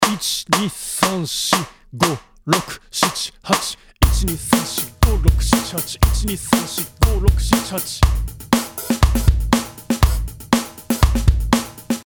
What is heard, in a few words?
8beat 16beat